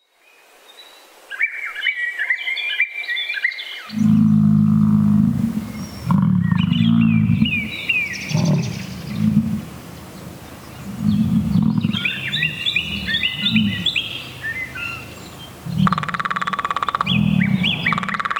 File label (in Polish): Wizytowki Dzwiekowe BIALOWIESKI PARK NARODOWY; Białowieski Park Narodowy